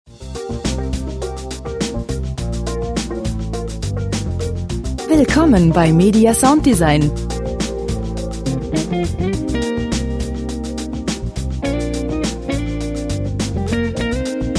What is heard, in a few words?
groovy